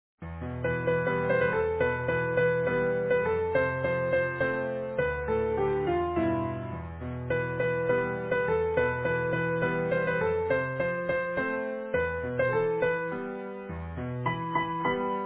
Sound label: easy piano